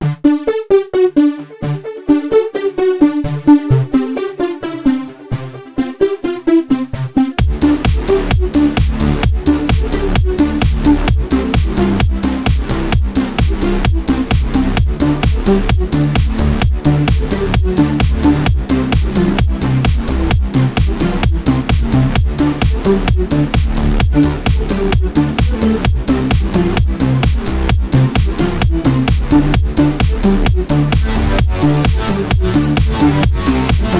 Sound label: Blips; MP2-chapter4